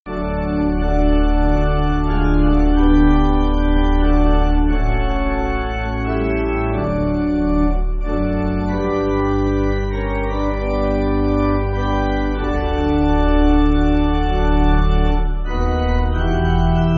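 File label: Pipe Organ, Backing Files for Churches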